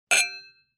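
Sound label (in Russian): хрустальные но без отголоска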